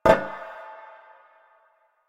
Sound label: Stone Cafe Table Impact; Warframe; NineteenNinetyNine